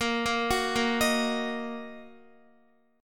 A sharp Suspended 4th Sharp 5th A sharp down Guitar; arpeggiated